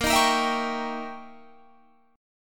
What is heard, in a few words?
Eb 7th Flat 9th, Bb